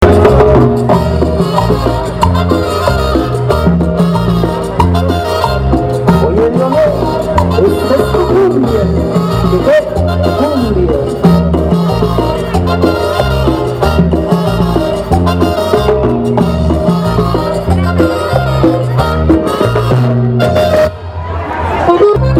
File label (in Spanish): cumbia